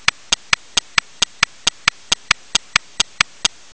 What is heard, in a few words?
tnt timer